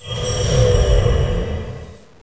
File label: Healing Wave; warcraft3